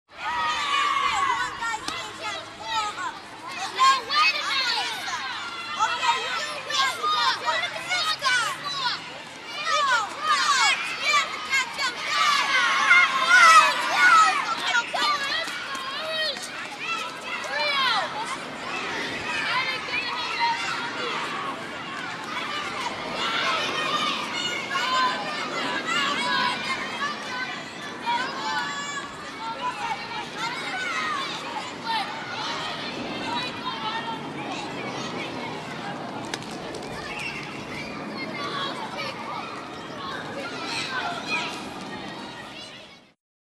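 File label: 9-year-old children on the street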